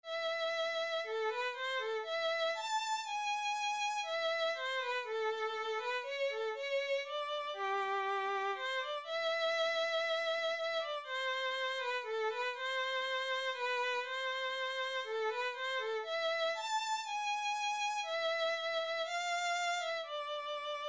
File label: Fantasia Violin